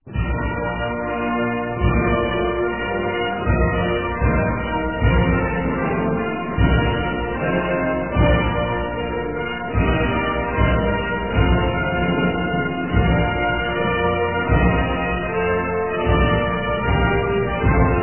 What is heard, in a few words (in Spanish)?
tono llamada himno nacional; España; tono móvil